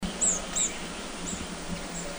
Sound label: Olive Backed Pipit Call; Guangxi Shanli